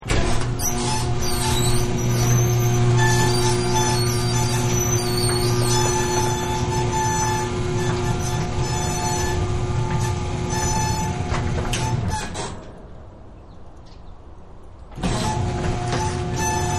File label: Auto Garage Door; Garage Doors; Partners In Rhyme